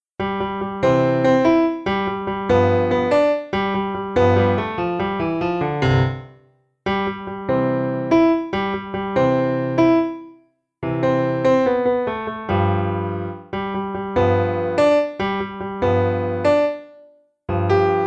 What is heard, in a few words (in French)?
Piano seul; La Cucaracha